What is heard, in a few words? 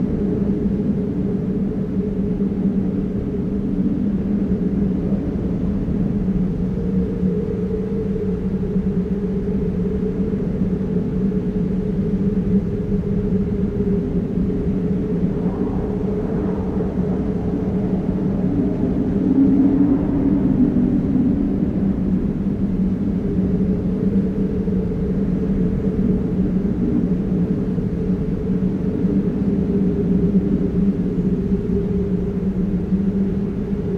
Wind ghost town